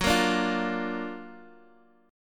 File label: F sharp Augmented Major 7th F sharp Guitar Drop D; x,x,4,3,3,1